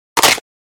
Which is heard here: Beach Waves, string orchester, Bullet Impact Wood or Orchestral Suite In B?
Bullet Impact Wood